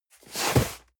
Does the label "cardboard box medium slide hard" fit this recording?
Yes